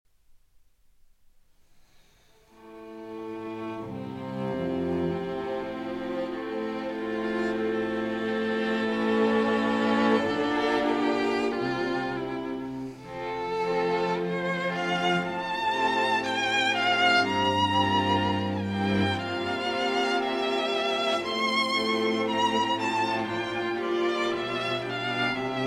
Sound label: String Quartet in B Flat Major; Brahms